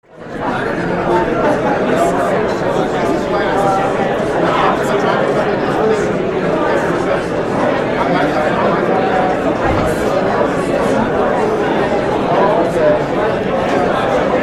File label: Huge Crowd talking up-close